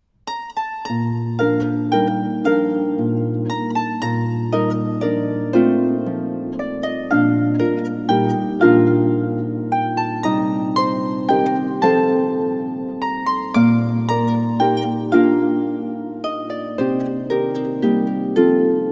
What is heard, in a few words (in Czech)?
Harfa